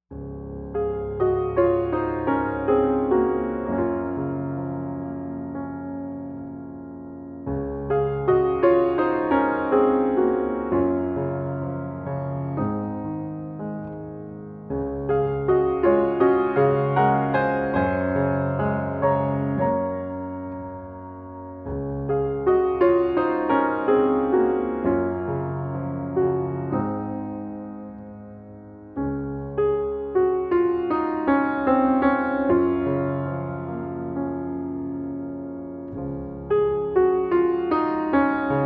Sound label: Harpstrings